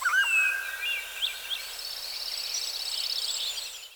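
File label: distant birds, forest; ambient; Half Life 2; Episode 2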